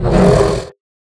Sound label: roar; sound designer